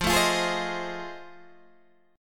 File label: F 7th Sharp 9th, Guitar, Open G